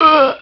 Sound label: zombie pain, male